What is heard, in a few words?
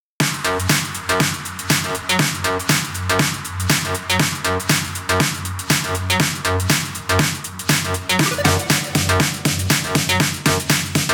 house electronic techno; sinyal mesaj4; By Burak Sound Bank; house electronic techno vol 6